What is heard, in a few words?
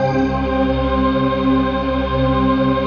ATMO PAD, Dance Mega Jungle Rave; Best Service